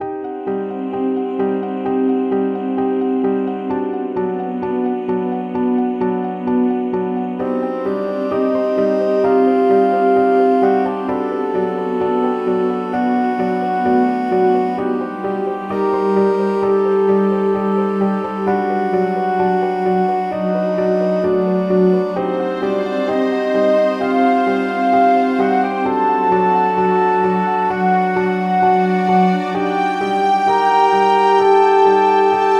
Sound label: Tense; OFF extended soundtrack 2014